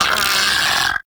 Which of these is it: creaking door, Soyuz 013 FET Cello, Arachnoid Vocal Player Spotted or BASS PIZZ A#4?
Arachnoid Vocal Player Spotted